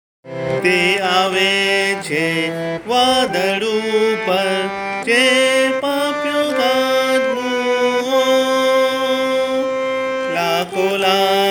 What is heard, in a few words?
Sung; ZION; LO, HE COMES WITH CLOUDS DESCENDING